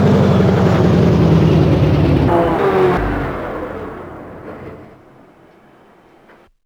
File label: tortoise end